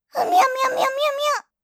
デバイスの接続の失敗

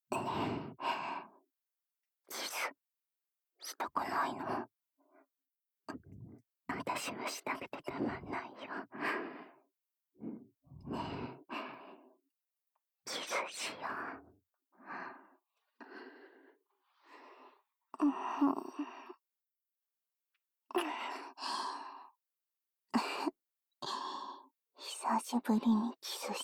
キス、ディープキス; そういえばキスしたのって久し振りだよね？

